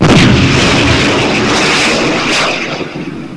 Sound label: mislaunch; quake2; kitsune